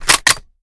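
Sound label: sniper rechamber scope bolt close; viper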